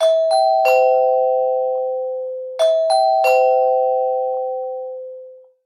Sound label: Doorbell ring